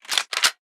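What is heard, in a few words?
Weapon rifle hunting reload chamber; Wpn riflehunting reloadchamber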